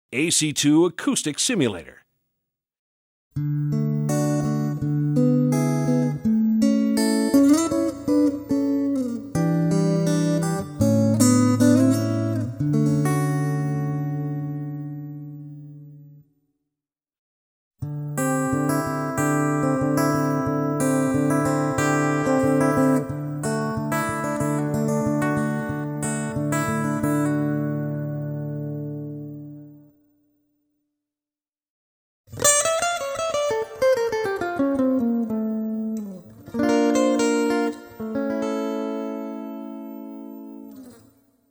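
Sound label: AC-2 Tone Bass; BOSAC2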